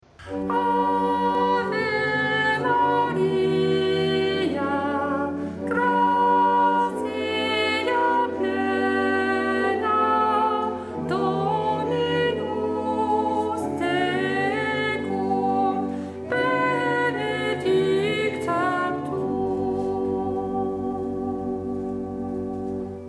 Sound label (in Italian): Soprani; Ave Maria